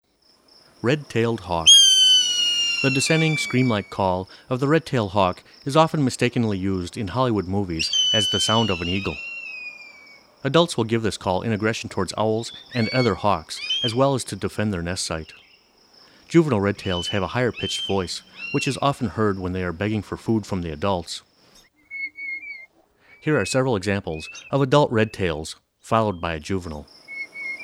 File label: Red tailed Hawk